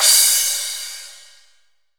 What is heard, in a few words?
Crash, Cymbals